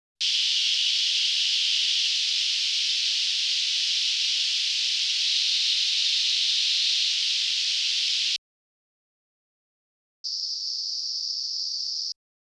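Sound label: 3.15khz, 4khz, 5khz -20/-16db L/R [L 1/3 octave Band Noise, R 1/1 octave Band Noise]; Denon Audio Technical CD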